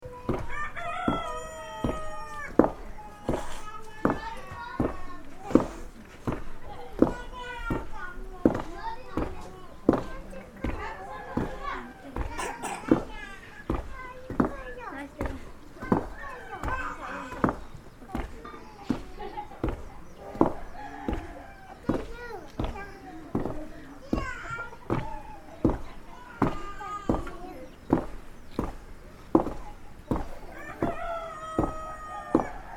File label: grain threshing, nepal